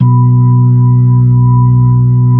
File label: B-3, Brite; Keyboards of The 60's and 70's